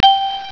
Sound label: TONE